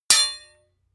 hammer hit metal hard

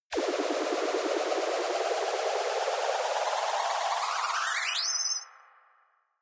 ufo depart modulated bright up

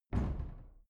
cupboard kitchen close

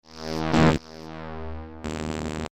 future buzzing elements layer

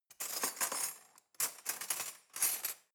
cutlery knife drawer rattle long

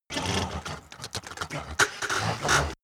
verbal noises

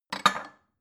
bowl shelve hard

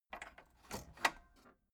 tape deck open

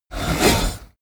sickle swing

